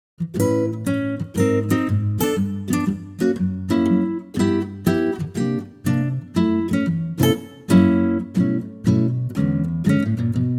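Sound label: Acoustic Guitar A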